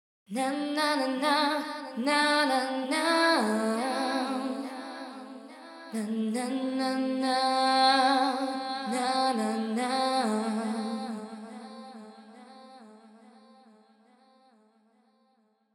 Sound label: VOCAL WET